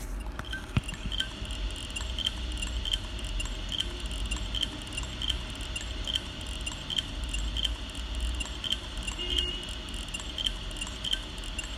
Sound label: noise